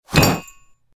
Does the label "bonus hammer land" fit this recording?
Yes